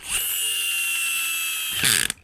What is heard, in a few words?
toy spring motor airplane small stop